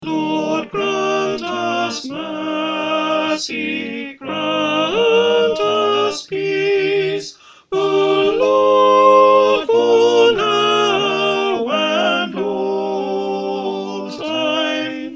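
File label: soprano, choir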